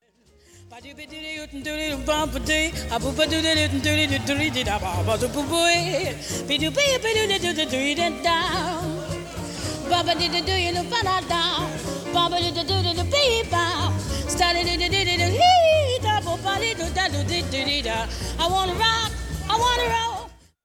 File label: scat singing